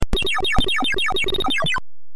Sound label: Laser Sms; Katakis